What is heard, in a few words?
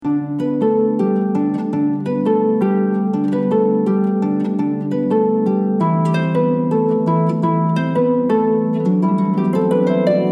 Jingle Bells, harp